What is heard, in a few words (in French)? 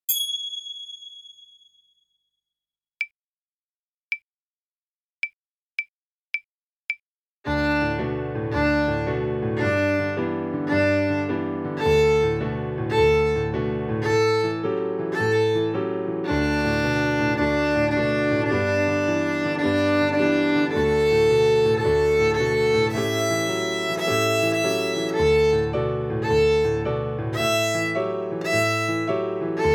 Detente Violon